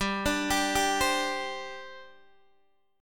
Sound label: G Suspended 4th, Guitar, Open D